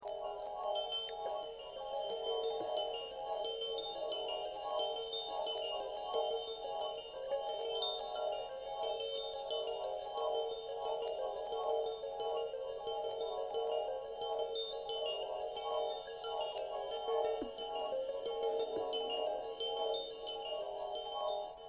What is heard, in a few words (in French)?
ambiance compactes